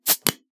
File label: Soda Bottle Open